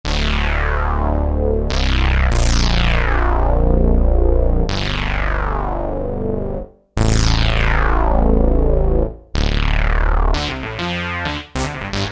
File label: Mega Mono Bass, Roland JD-990; Factory Patches